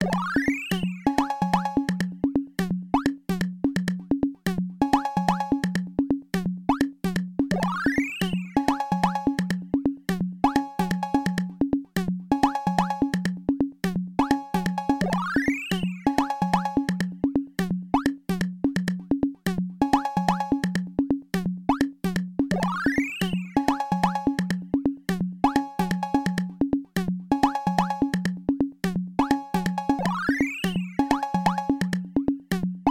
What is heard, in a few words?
bgm comical battle; desert bonds 01; story